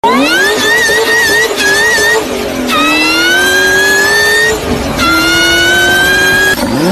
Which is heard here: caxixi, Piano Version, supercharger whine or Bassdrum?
supercharger whine